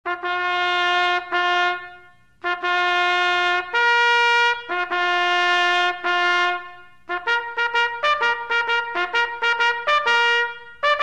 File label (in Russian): zvuk voennogo gorna